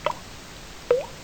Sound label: drip